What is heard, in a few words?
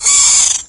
tick sight; doom3